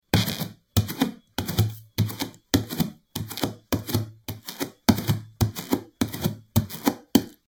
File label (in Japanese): フローリングの上を裸足で歩く音